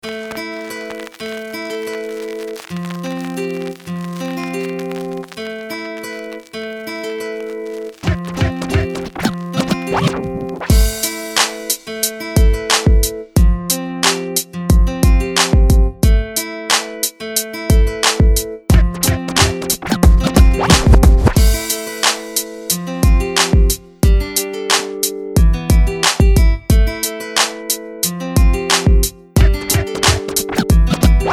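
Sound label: Beat and Guitar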